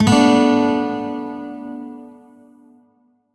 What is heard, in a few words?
Shawzin Maj Chord L; Warframe; Lotus Shawzin